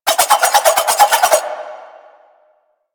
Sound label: Elite Shotgun Projectile; Warframe; Weapons